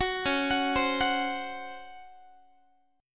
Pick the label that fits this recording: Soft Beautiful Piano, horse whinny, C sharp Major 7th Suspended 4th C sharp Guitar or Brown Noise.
C sharp Major 7th Suspended 4th C sharp Guitar